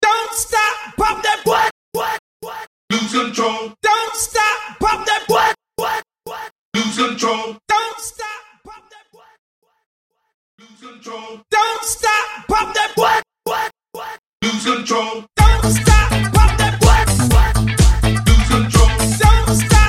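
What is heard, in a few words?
Dance Mainstream Acapella In; Vegas Edit; 125 Bpm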